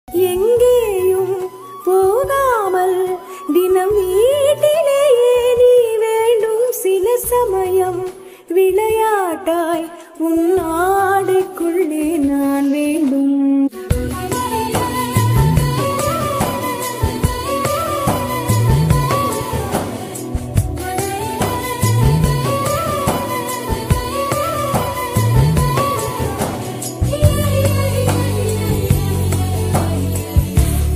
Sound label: song, tamil